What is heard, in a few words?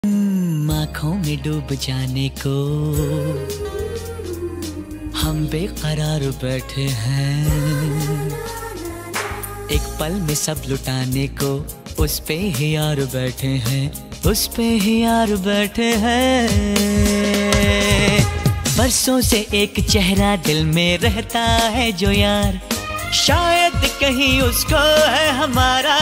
hindi ringtone